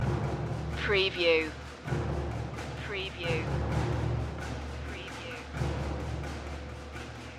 epic medieval tale loop

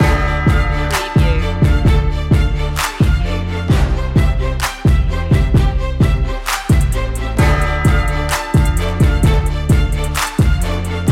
beast mode loop

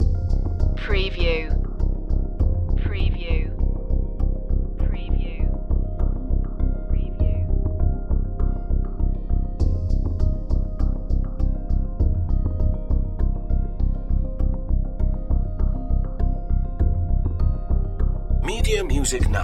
tension pulse loop

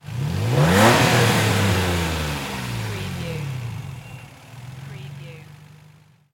NISSAN 350Z ENGINE SINGLE REV

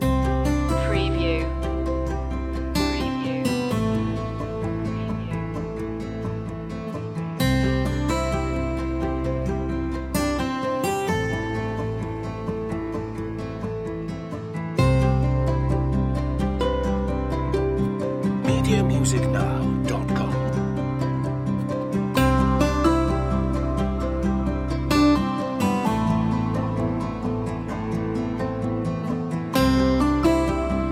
colors joyful guitars loop

